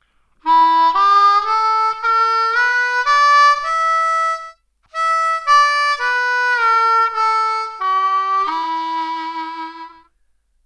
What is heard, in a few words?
Blues Scale 2nd Position A harp; 3rd Position Blues Harp – An Introduction